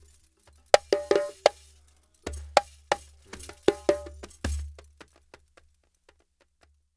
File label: Djembe with Kessing, African; Sonic Foundry (Sony Creative Software); Continental Drift Aftershocks